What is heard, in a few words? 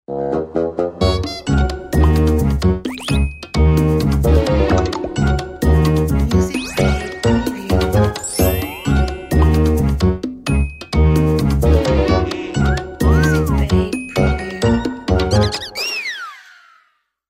Funny Comedy